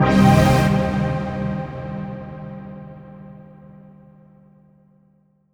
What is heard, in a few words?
STAB; DNA Encoding FX; Polyend; Plughugger